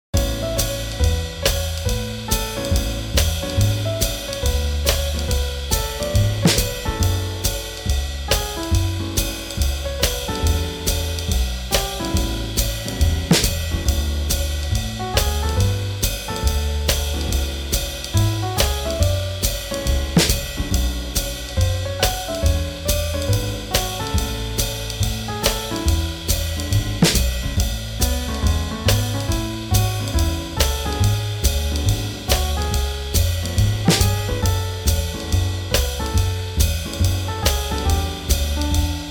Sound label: Swing Jazz